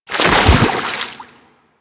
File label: Roll Forward Foley Water A; Enemies; Warframe; Teshin; DE